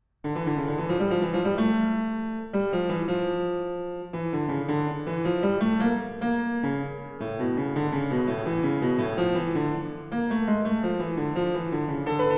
Canon alla Duodecima in Contrapuncto alla Quinta, Piano; Bach - Die Kunst der Fuge, BWV 1080